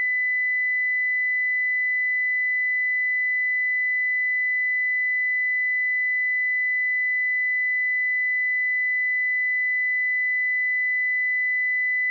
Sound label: 2 kHz Sinus 20db; IPmotion Test Drive